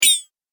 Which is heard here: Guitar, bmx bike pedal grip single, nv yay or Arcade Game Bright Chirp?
Arcade Game Bright Chirp